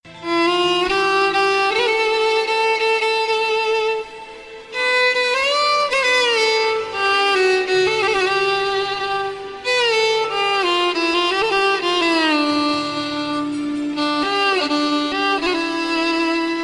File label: Cute Violin